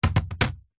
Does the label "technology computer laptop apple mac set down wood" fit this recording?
Yes